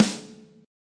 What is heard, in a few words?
snare yamaha gs; single hits